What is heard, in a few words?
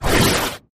skill bullet; calligrapher